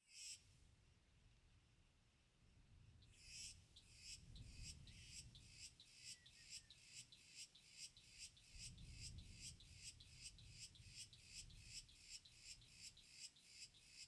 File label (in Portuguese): Cigarra Grave em Mata Fechada e Proxima ao Microfone; Cerrado; insetos; colecao sonora do cerrado